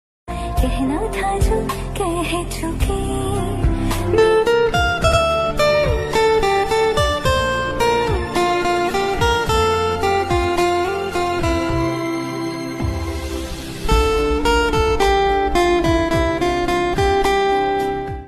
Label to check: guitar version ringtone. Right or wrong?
Right